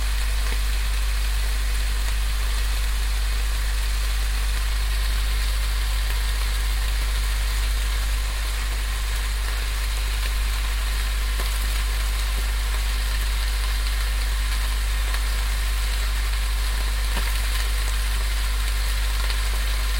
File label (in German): Grill in vollem Gange